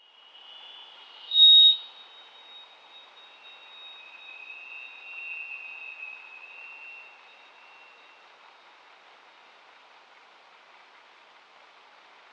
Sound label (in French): sonar, plancton